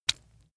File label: leather impact mud soft